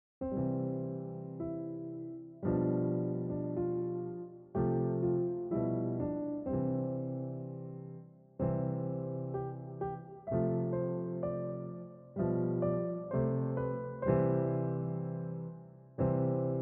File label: Happy Chimes